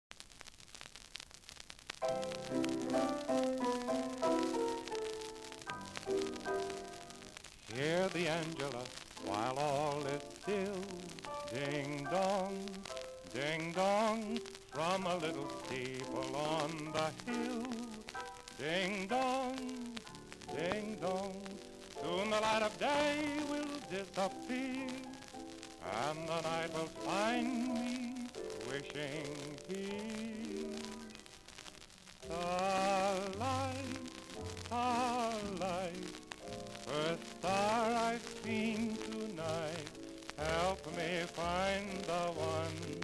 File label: Orchestra, vocal; Clarion; Popular Jazz Archive 1920-1936